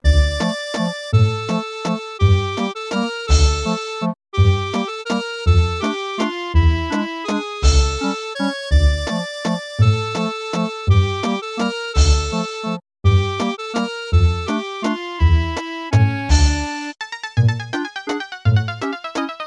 Waltz; animal crossing full gamerip

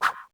Jump; littlebigplanet 2 ps3 gamerip 2011

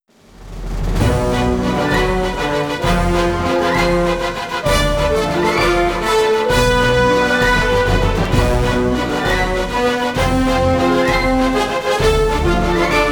Battle

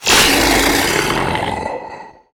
Voices Mob death; Mob blevonator

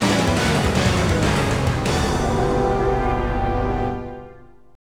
Draw Game; Avengers in Galactic Storm; Deco MLC System arcade gamerip 1995

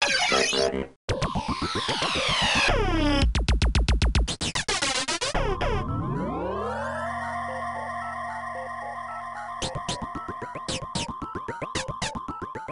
Game Start - Cosmo Field Ambience; solvalou namco system 21 arcade

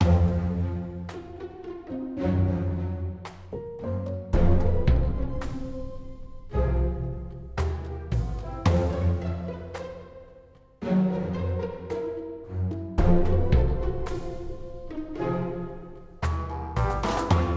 Slime Neutral

